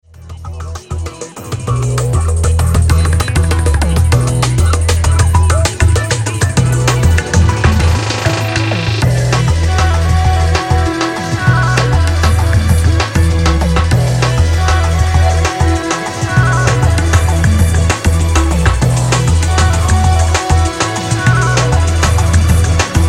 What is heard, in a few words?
DOOT